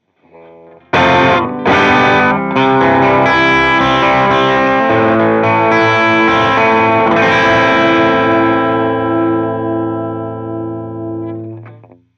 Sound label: Fender Bassroom mic change TUBE 5881